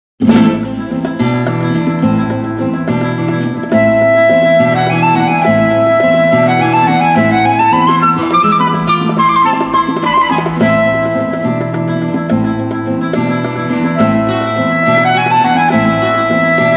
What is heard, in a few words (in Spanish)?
Danza espanola, 3 Musicians; Manuel de falla